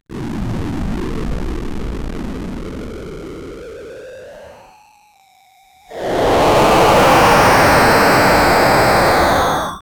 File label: weak mutated monster jump, strained